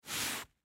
Carpet Clean Rub, Carpet Clean Rub Paper Towel Single; Cleaning; Household; Blastwave